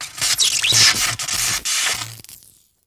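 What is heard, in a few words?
Electric Arc Multi Zap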